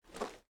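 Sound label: footstep armor; Smite; god Kuzenbo v2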